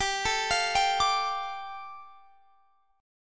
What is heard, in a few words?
G 7th Suspended 2nd